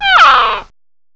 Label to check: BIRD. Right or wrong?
Right